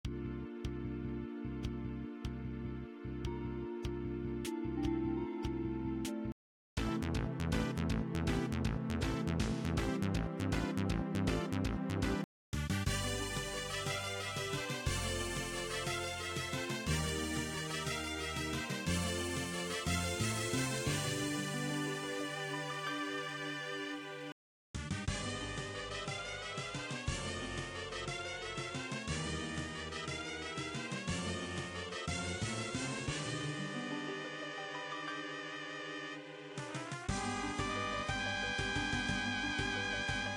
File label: midi noise